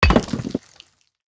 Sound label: axe mining stone; immersive sounds; Factorio Paranoidal mod